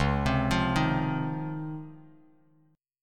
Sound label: C sharp Major 9th C sharp, Bass Standard; C#M9 arpeggiated; JGuitar